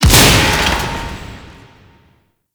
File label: Mech Shield Break; Vehicles; Abilities; Thano Mech; Warframe; Nechro Mech